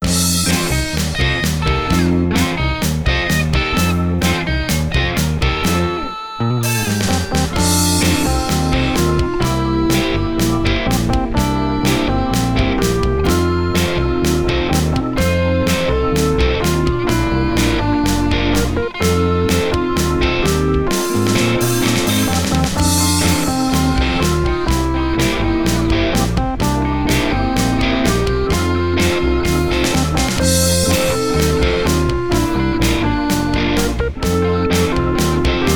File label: happy birthday rock